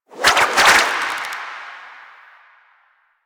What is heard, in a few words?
Kumihimo String Whip; Warframe; Koumei